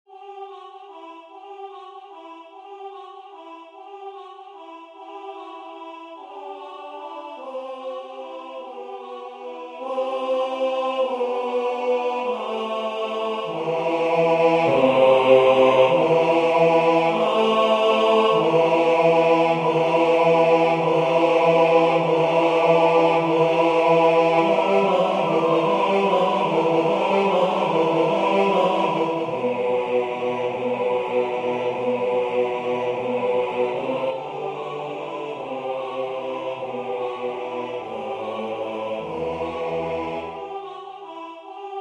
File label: ukrainian bell song